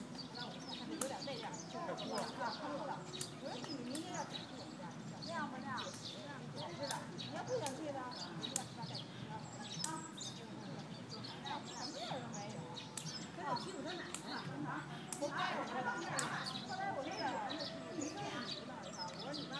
the magical sounds of jing shan park

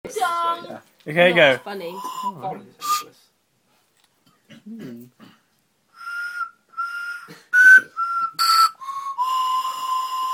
cracker whistle

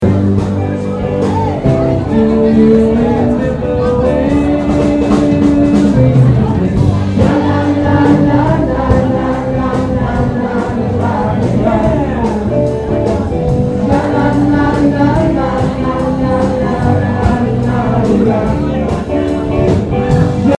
band at banquet; cornell ithaca

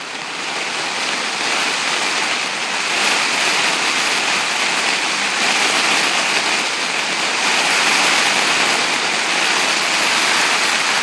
rain

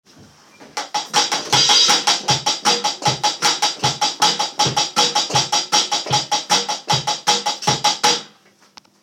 drums

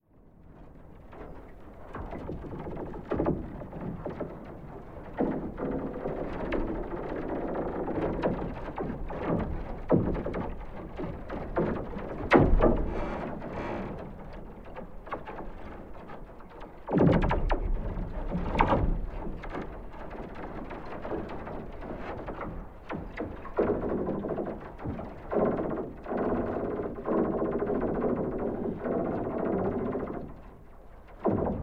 surface tension of a frozen pond

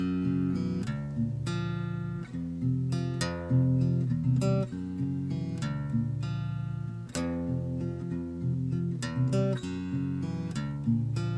another rough acoustic idea

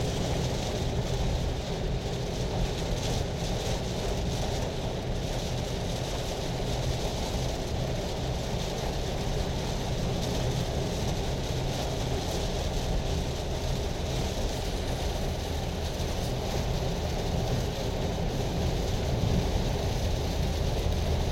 drive

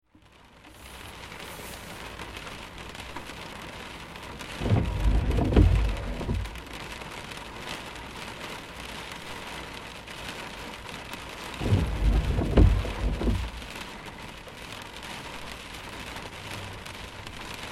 heavy rain; st mary's

